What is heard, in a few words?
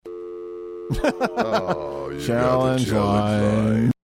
AWWWW